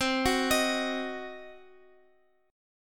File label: C Diminished C, Guitar Open F; Cdim arpeggiated